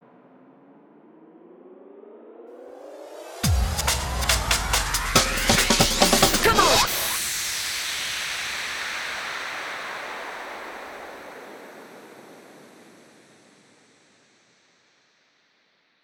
140BPM 4Bars, HandsUp & Trance Fills; Come On 1; Vengeance Samples

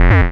Offbeat Bass F; Vengeance Samples

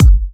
Bassdrum; Vengeance Samples

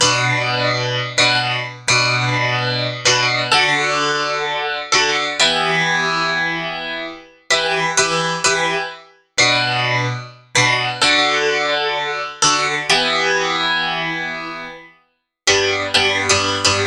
Piano, 128 BPM; Vengeance Samples; Vocal-Hook Kits; Song Kit

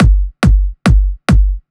Kick 140BPM; G minor; Drums & Perc; Vengeance Samples; Space Of Time Kit

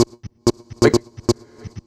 House Loops, Vocal Loops House 128BPM

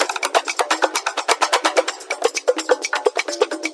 Latin House Loop 128 BPM; Vengeance Samples

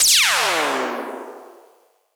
Lazers

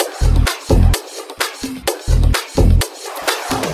House Loop, 128 BPM; Vengeance Samples

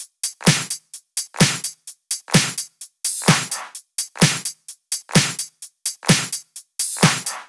128BPM Dubwise Kit

